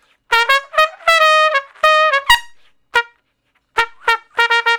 Trumpet Straight (Bb); Solos; Crimson Blue and Fabulous Horncraft 4 RnB; Horncraft for R&B

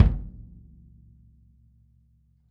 kick

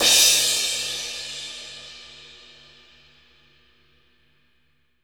DUAL CRASH

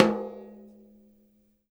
JAZZ KIT TOM, Drums Acoustic; USB Soundscan vol.10; AKAI; JAZZKIT 3

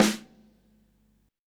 FX SD, Drums Acoustic; USB Soundscan vol.10; AKAI; 90s sample CD; ALL SD

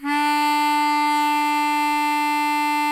HARMONICA D3; Sonic Reality; American Heartland

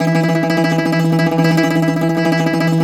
BZ TREME, BOUZ TREM

